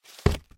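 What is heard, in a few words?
zakryli knigu, book closed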